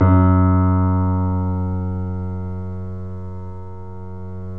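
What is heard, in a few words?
F#1, Bösendorfer, 3D Pianos; E-MU Producer Series Vol. 5 – 3-D Audio Collection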